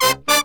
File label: BRASS SALSA; Best Service ProSamples vol.14; AKAI